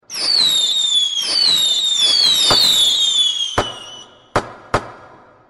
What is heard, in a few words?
Fireworks; New Year Ringtones